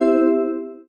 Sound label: button